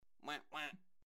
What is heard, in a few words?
quack quack